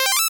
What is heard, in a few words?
8bit se